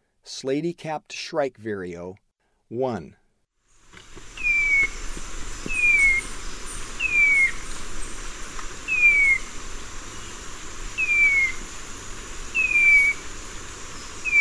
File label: Slaty-capped Shrike-Vireo; S. America; Eastern Lowlands